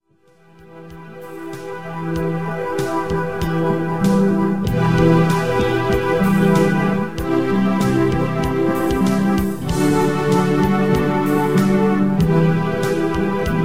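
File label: instrumental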